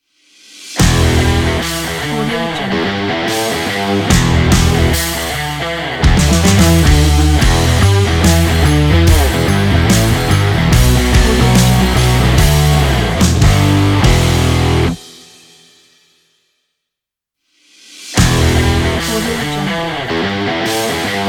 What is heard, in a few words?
energetic rock ident